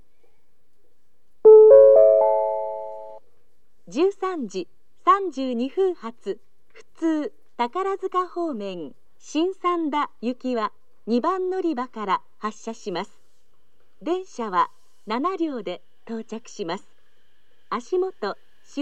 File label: local, station; shin-sanda; jr-west; suita